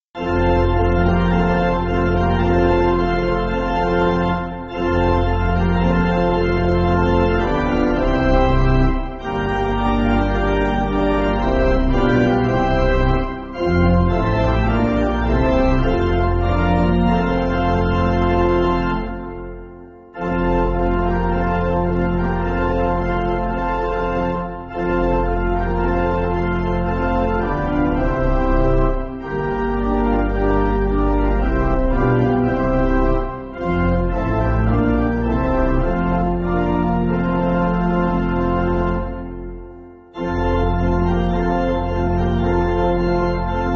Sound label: Jesus Loved Each, pipe organ; Cecilia 7.7.7.7